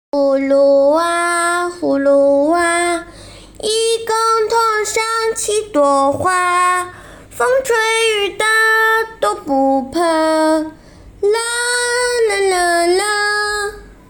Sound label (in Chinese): 童声歌曲